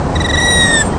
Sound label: dive sound